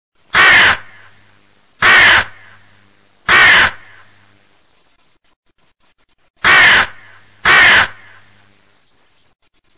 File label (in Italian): cornacchia